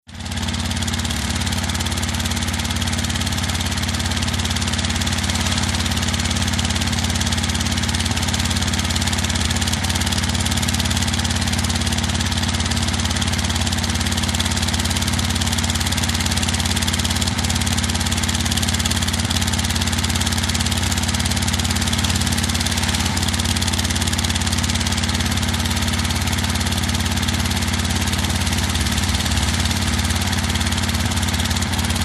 Cement Mixer Idle; MACHINES - CONSTRUCTION & FACTORY CEMENT MIXER: INT: Cement mixer, motor idle, occasional s

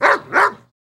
Dog Bark Generic X2, golden setter

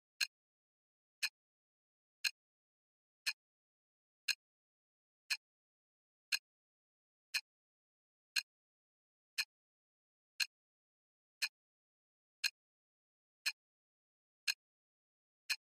Ticking Timer Type 1 Fifteen Seconds

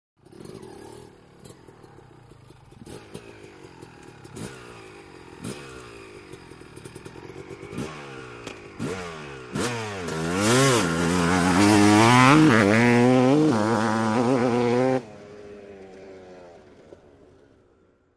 Motorcycle 250 Away, motocross

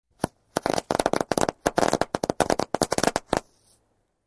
Roll Firecrackers

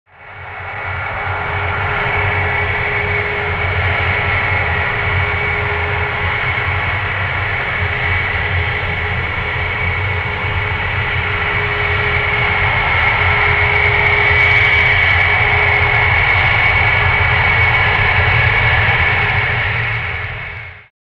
Jet Taxi Concorde, concorde

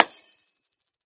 Light Bulb Crash

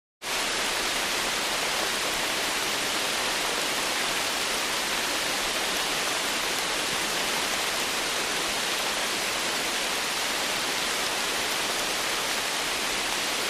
Heavy Tropical

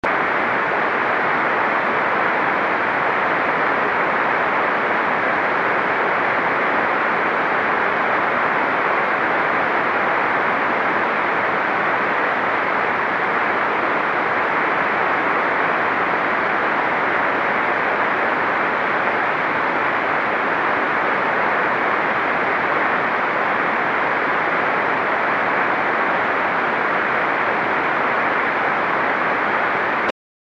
Static Solid Thin FM, static

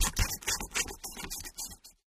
scissors cut paper

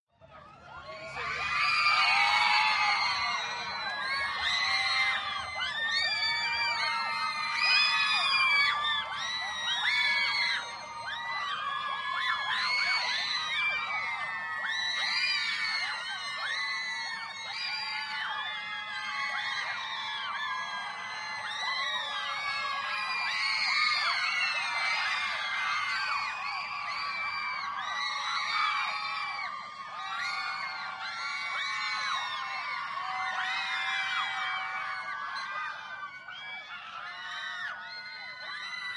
Crowd Teenage Girls, medium

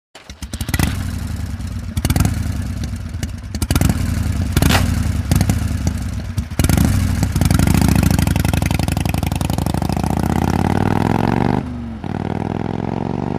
Start Away Harley Davidson

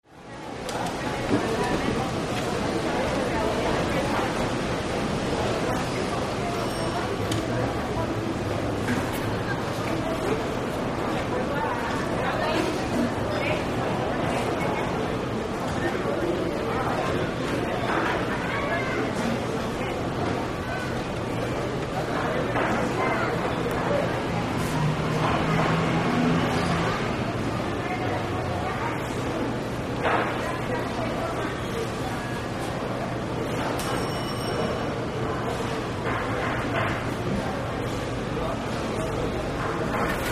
Market Amb Busy Spanish

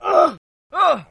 Death Screams 3; 10 Male Screams, Various

